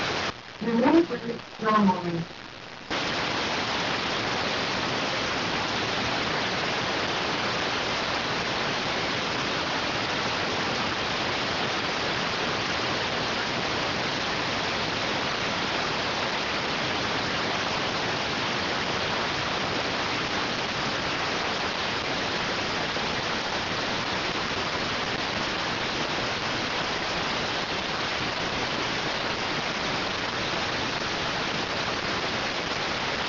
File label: rtty rx